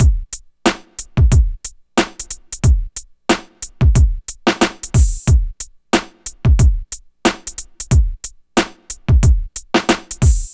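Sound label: drum, Hip Hop & RnB; ProSamples vol.34 - Hip Hop & RnB 3; Okey Dokey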